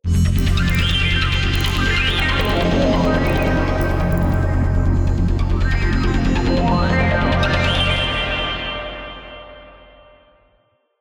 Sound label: Level Complete arcade